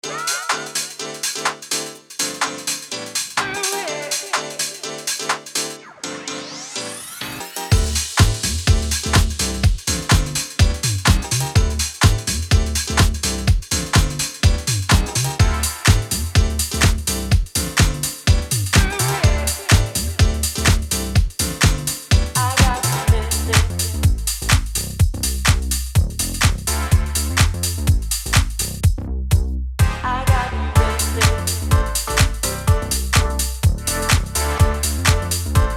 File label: 90s house